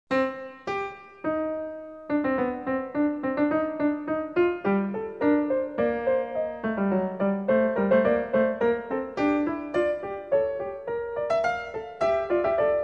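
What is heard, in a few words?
fugue C minor, classical keyboard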